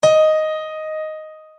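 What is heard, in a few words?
D sharp 3 piano; notes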